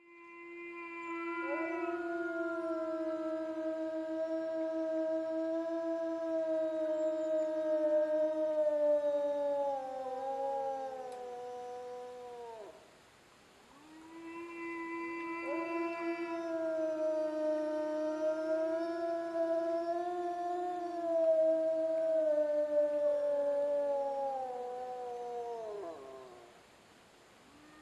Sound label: howl